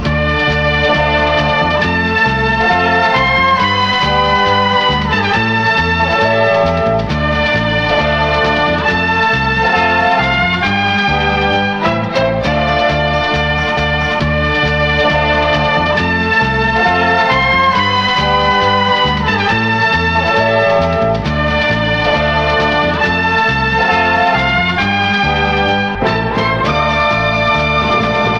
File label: strings, loops, phonky